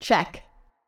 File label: move check